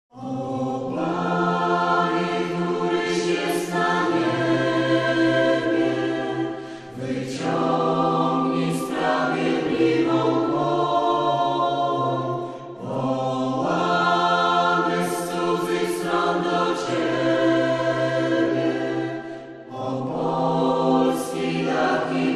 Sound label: acapp